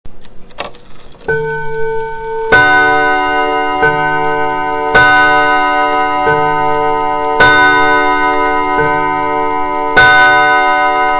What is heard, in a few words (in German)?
Kaminuhr, Grossuhren